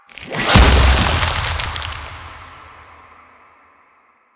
Frost Snow Globe Activate; Warframe; Warframes

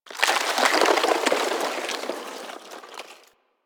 Landscape Ice Slide Medium, Ambience; One Offs; Warframe; Venus Open World

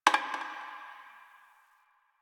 Garbage Metal Can; Ambience; Warframe; NineteenNinetyNine

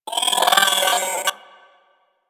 Hyena Micro Vocal Death; Warframe

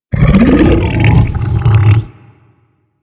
Feed React Neutral; Helminth; Warframe; Hub; Liset Alchemy Lab

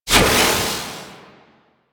Operator Wraith Void Projection Cast; Warframe; Focus; Powers; Wraith Movement

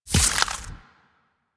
Arch Gun Primary Beam Impact Flesh, Weapons; Warframe; Archwing; Hildryn

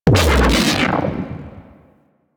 Pet Mod Lift Bomb Activate; Warframe; Pets; Moa; Mods

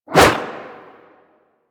Staff Whoosh; Golden Band; Warframe; Monkey King

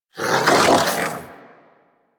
Predasite Vocal Alerted; Warframe; Hunting Critters

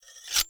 Dagger Unequip; Weapons; Enemy; Daggers; Sinecure Dagger

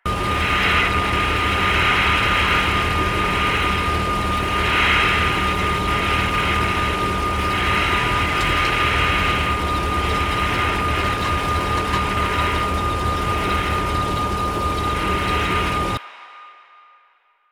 Parked Car Idle Loop; Warframe; Ambience; Nineteen Ninety Nine

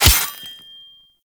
Melee Sword Impact Robotic Flesh; Weapons; Impacts; Swords

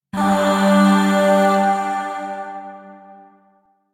Shawzin Chromatic Chord E; Chords; Warframe; Zariman; Void

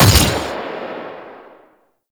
Fire Shot; Warframe; Weapons; Duviri; Incarnon Adapters; Incarnon Sybaris Prime

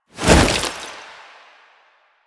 Gap Close Jump; Lasrian Butcher; Warframe; Lasrian Soldiers; Foley; Stance

